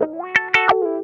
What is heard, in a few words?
CROAK LICK, Funk Guitar; Zero G - Funk Guitar